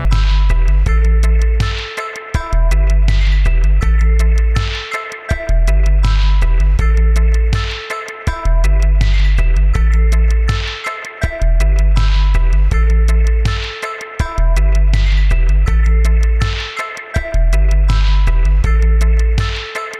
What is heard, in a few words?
reggae; riddim